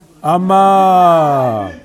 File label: amaaaaaar